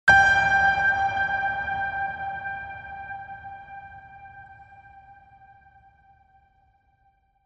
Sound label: Trailer Tools Piano Ping; Production Music; CATastrophic